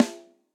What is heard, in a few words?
Snare Hit & Rim; Drum Hits; Cop Style; Ableton; Factory Packs; Session Drums Studio; MPE Hits